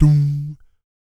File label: VOC PERC, Vocal Planet Jazz FX BASS PERC